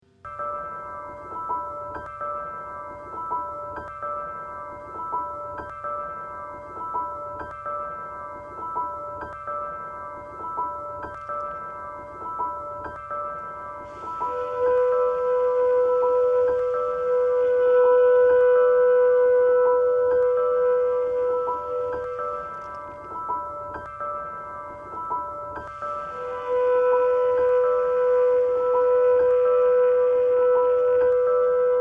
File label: ambulance for the ambience